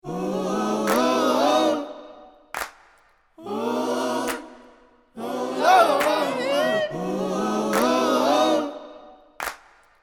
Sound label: Gospel choir